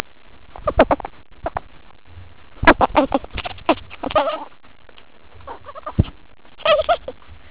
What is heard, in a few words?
chuckle, ferret